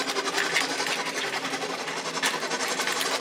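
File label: frost projectile, magic; skyrim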